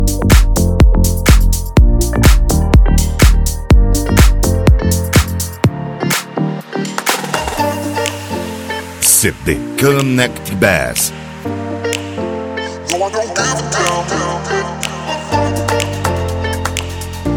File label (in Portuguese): bass house e rap